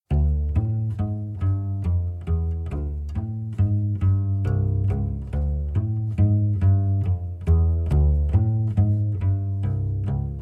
polska; polska efter jonk jonas

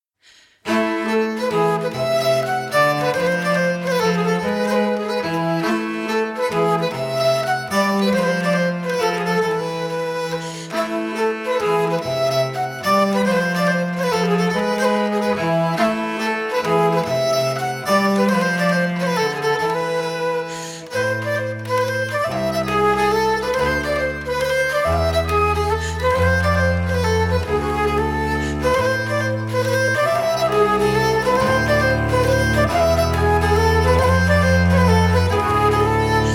polska; polska efter evert ahs

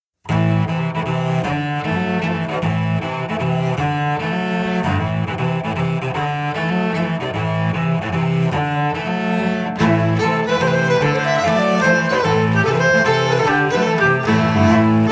polska; polska efter lejsme per

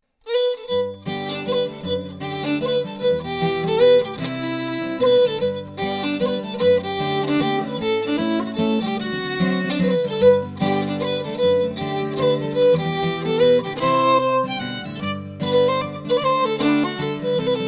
mazurka, irish traditional dance music; the ferry boat mazurka

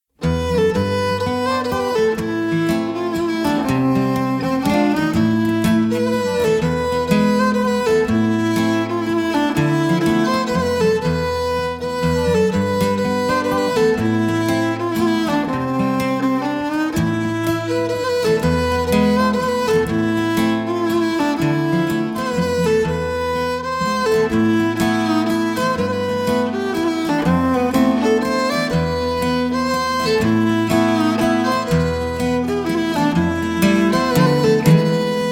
mazurka

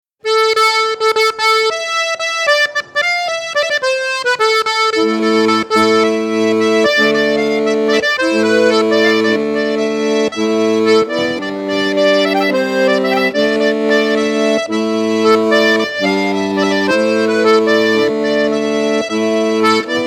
gavotte du bas leon

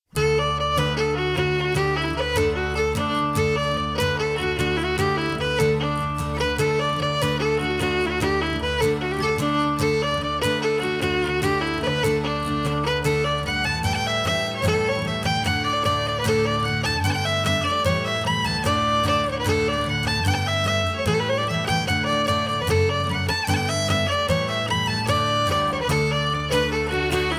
polkas; goat's polka

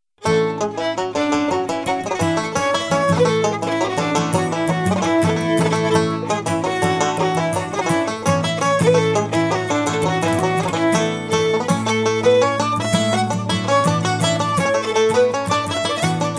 connemara jig set, irish traditional dance music; fig 5 peata

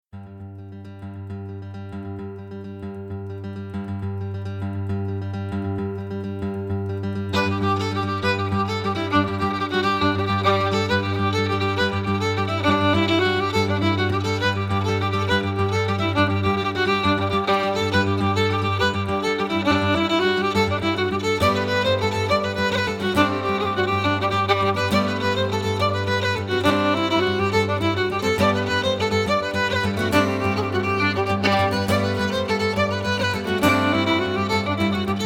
jigs

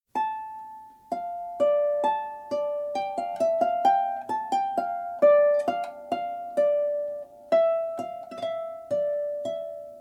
slangpolska

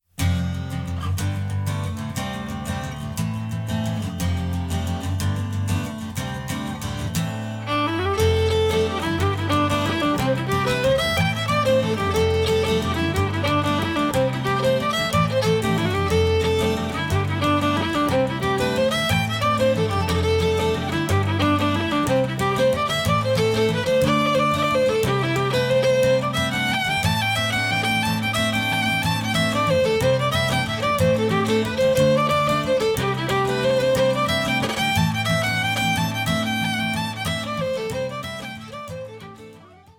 jigs; the spotted dog

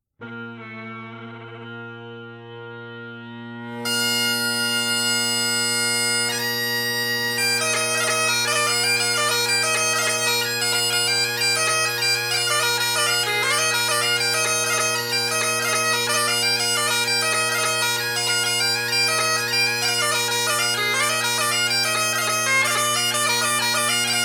jigs; lochbroom

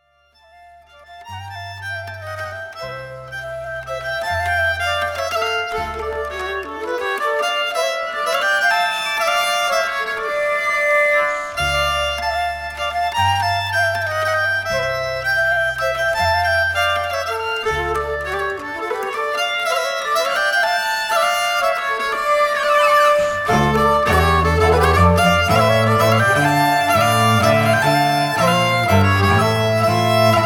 rundtom; rundtom efter christian horne

